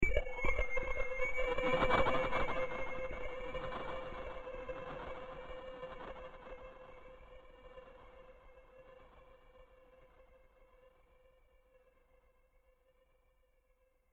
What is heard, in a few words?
Eerie radio signal breaks.; Communications; Broken Signal